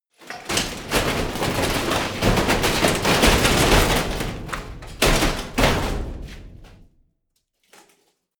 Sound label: metal crash medium